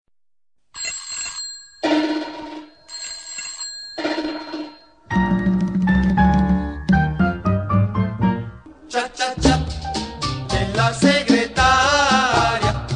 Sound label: cha cha cha